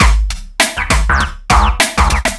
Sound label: Orbish Funk, Synchro Funk, Beats